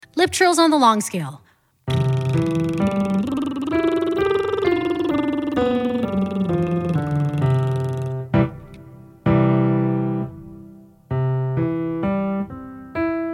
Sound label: lip trills one and a half octaves low range, vocal exercises